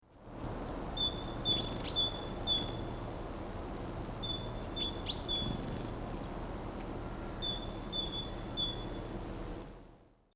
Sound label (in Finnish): tittyy; talitiainen; laulu2; linnut